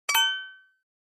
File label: Flash Beep Dbl Guitar